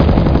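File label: machine gun; godzilla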